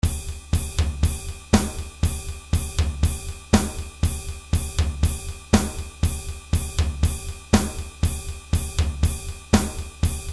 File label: drums